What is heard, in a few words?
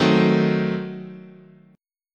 Eb Diminished 7th, chord